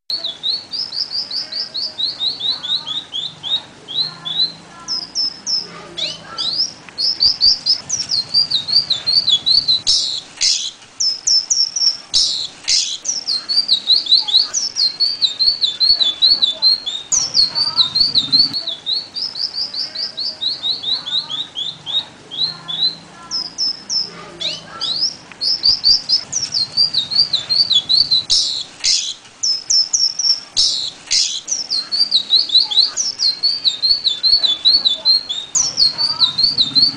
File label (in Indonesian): sikatan ninon